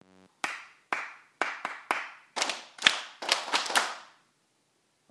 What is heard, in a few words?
clap